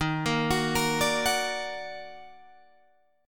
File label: Eb Minor Major 7th Eb down Guitar Open G, chord